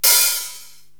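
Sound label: Hi Hat Open, Korg TR-Rack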